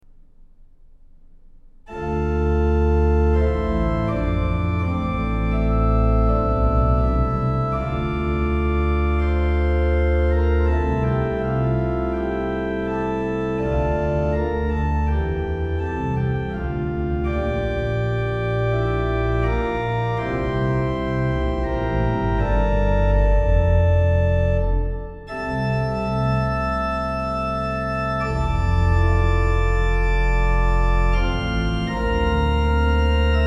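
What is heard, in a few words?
Organ Prelude with suspension appoggiatura; computer-music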